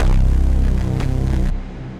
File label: Darkness Bass; The Forge by Hecq; Ableton; Factory Packs